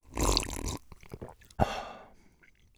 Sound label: sip of water